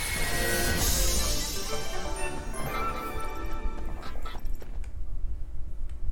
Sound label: success